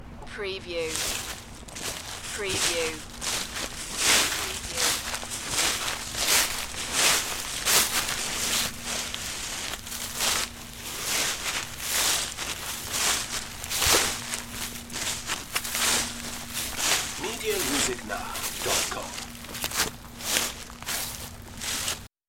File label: Leaf Walk